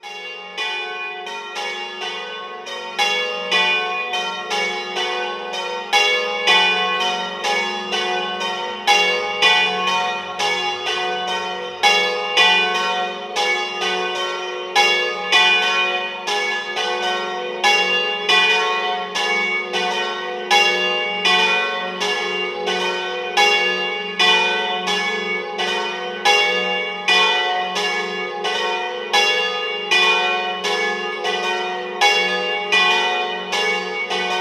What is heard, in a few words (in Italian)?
campane